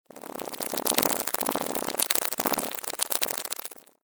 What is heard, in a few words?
Hungry Alien Nibblers; Alien Sound Effects; science fiction sound effect